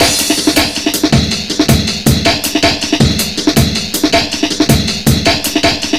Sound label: 160, Drum Loops, Drum & Bass; Total Drum&Bass